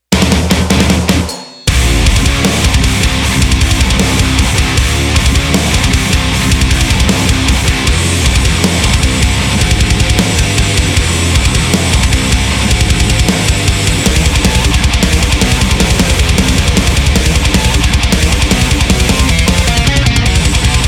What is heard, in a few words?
metal